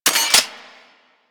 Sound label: Heavy Shotgun Mech; Weapons; Warframe; Tenno